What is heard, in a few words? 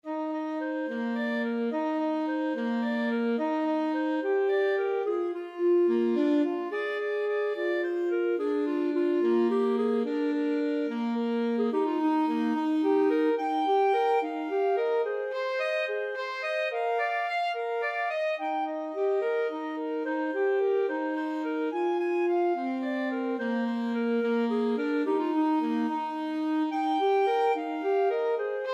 cielito lindo, clarinet saxophone duet